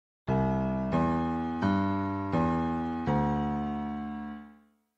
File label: Piano Puzzle; Find the Chomiks